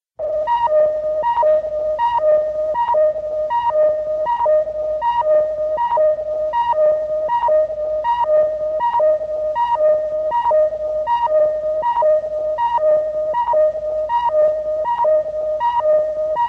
ultraman timer; children

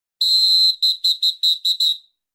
referee whistle

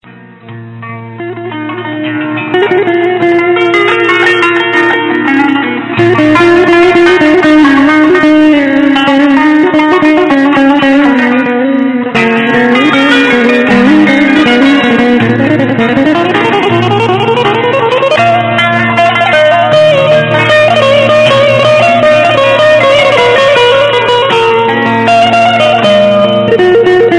ring tone; alternative

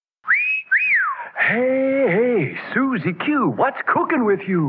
whistle

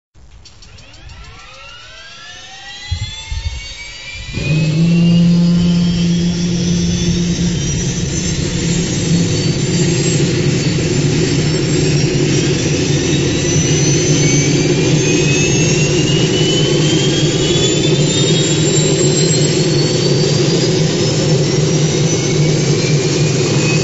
turbine; ringtones

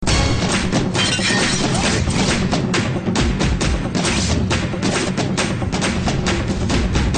flute, ringtone

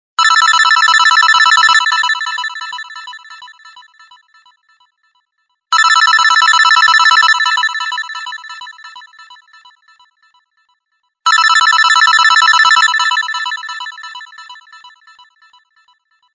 decent office ringtone

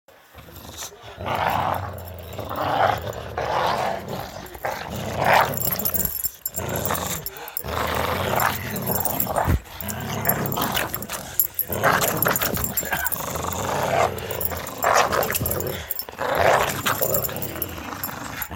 dogs growl; animals

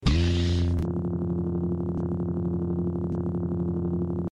Lightsaber

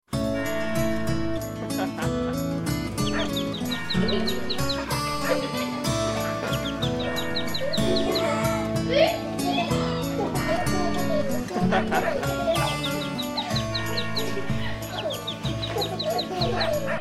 guitar tune

